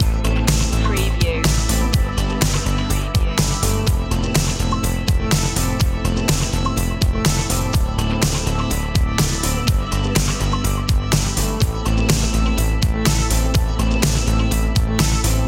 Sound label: 8 bit skyway loop